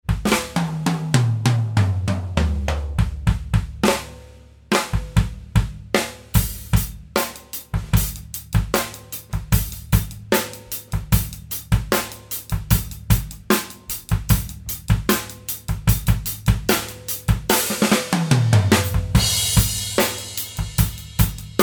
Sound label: Groove